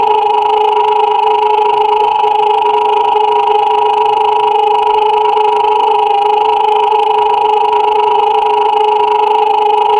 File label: sci-fi retrofuturistic